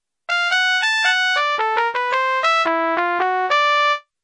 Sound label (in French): Trompette solo